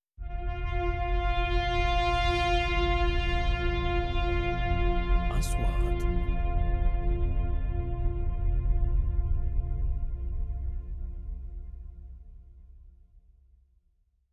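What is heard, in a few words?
3D SFX Trailer; مؤثرات تشويقية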